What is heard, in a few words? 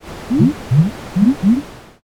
poison